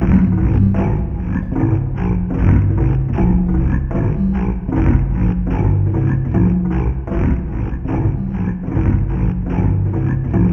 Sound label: C2, Synth Line; 90 s Sample CDs; NiceBeats; SynthLine VOL-30